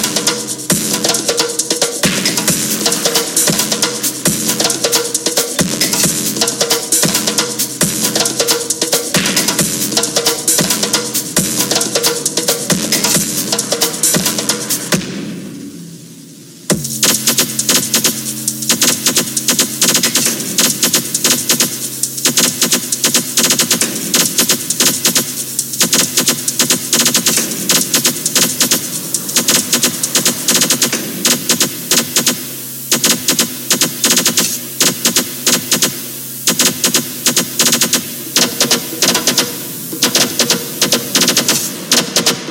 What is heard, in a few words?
Djembe